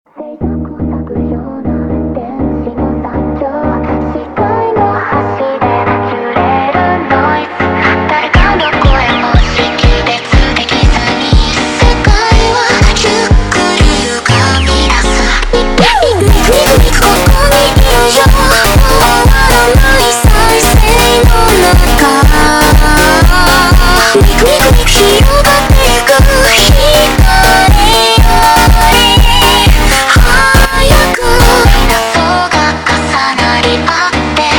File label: Montagem slowed, funk